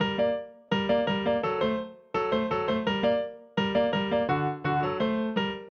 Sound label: Acoustic Piano